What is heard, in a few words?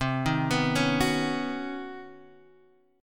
B Major Add 9th C, chord; Badd9/C; arpeggiated; JGuitar